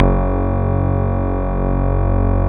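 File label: synth, MicroWave; Sound & Vision - Gigapack I CD 2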